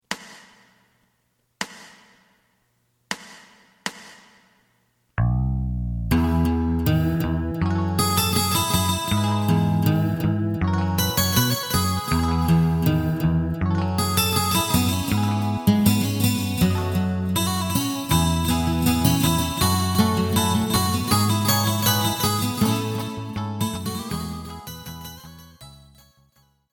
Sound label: drum; エレキバンド用カラオケCD